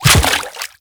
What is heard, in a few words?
Step Water, Footsteps; Warframe; The New War; Characters; Kahl